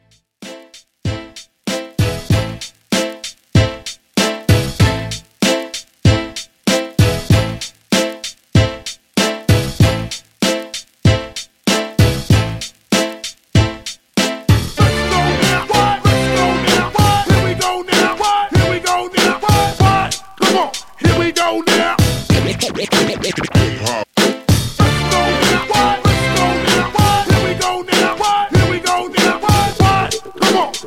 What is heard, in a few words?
Hype Intro Redrum 96Bpm